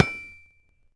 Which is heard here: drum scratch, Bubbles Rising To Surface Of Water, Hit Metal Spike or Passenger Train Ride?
Hit Metal Spike